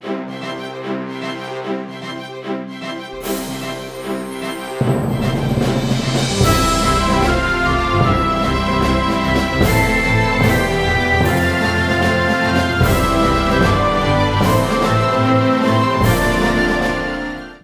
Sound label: Outro Music